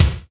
kick, Roland R-70, BD

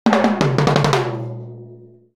Timbale Fill; Sonic Foundry (Sony Creative Software) - Essential Percussion

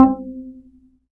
G string upright bass; Upright Acoustic Bass

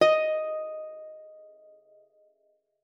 D#5, guitar; Xsample VOL-2

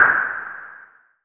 SYNTH SNARE; Best Service Alpha Dance vol.2

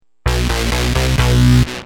Heavy, Bass; Industrial

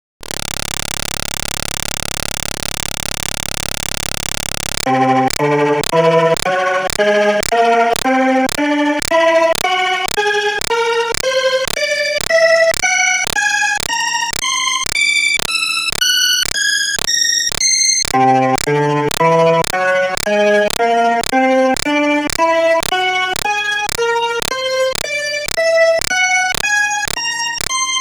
B3 Organ; Masterbits CLIMAX 8

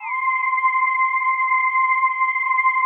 PAD, DIGI-PAD

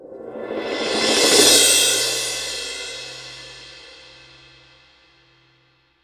cymbal swell; Voice Crystal - Steve Reid Definitive Percussion

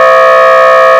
lead; Stereo

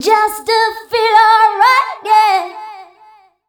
Dance Vocals, FEMALE

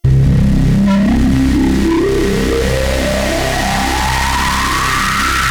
Noisy JX Sweep; AMG - Prototype Drum & Bass

